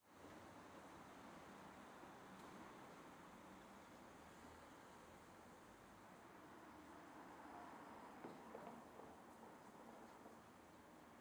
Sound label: Ambience, Suburb, Quiet Afternoon, Light Traffic, Stereo; Sonik Sound Library; Spatial Cities